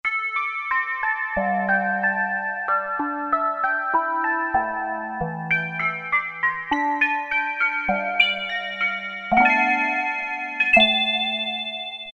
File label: Space Chimes, Roland Juno-106; Factory Preset